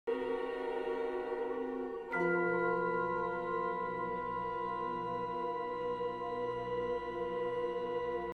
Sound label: Clocks And Clouds; Ligeti